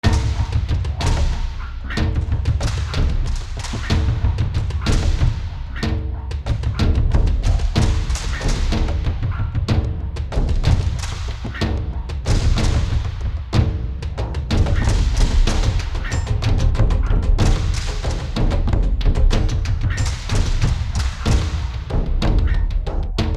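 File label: Battle Loop Rural Percussion; Fallout New Vegas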